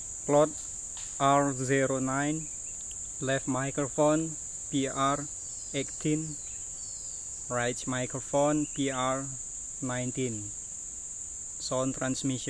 birds

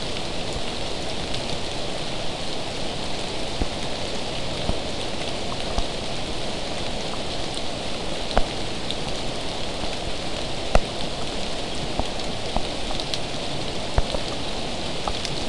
mainly rain

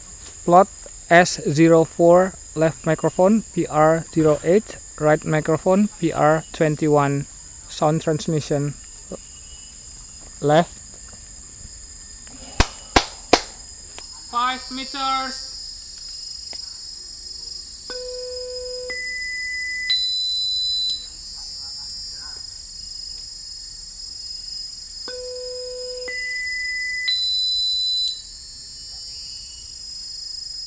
birds

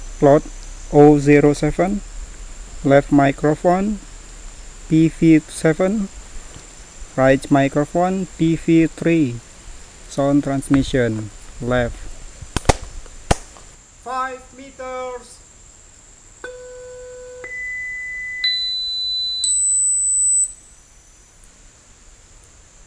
birds

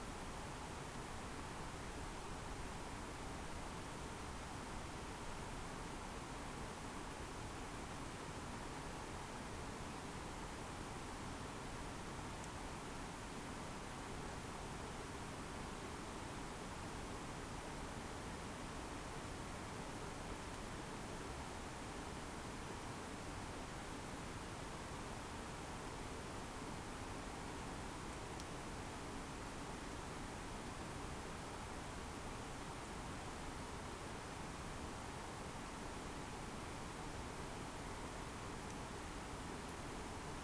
no obvious biophony

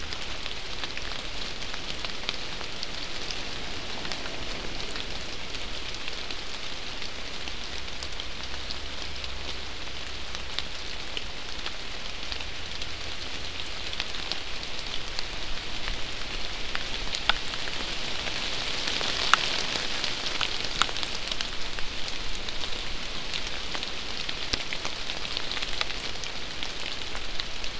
mainly rain

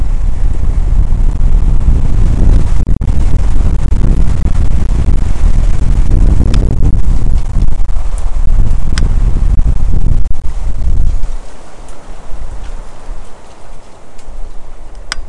no obvious biophony

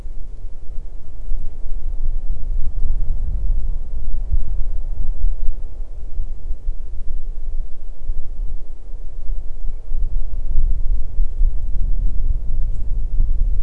no obvious biophony

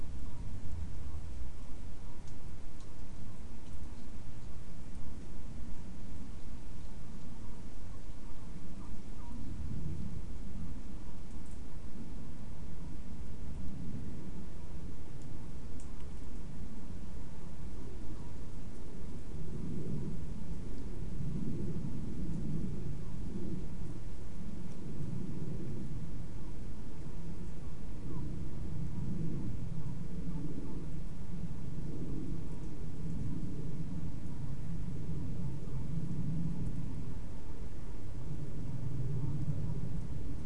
no obvious biophony

